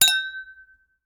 Glasses Hit and Ring; Crashes; 1001 Sound Effects

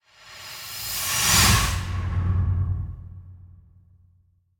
Whoosh Harsh Flying Whooshes; Hollywood Edge; sound designer's toolkit v2

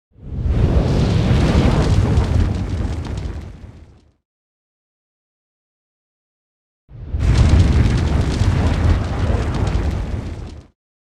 Dragon Fire Whooshes With Fire Crackle Element; Sound Ideas; Series 2000

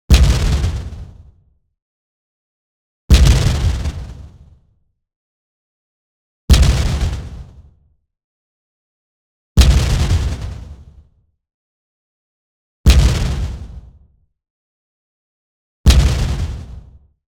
EXPLOSION SHORT Crunchy White Noise Sine; boom library; Anime Essentials

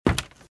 Footsteps - Wood - Creaky - Run - Right

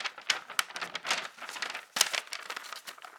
Paper Map Fold; SFX Kit; Foley - Misc

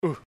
Oof; blindside

